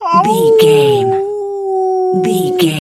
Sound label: wolf howl short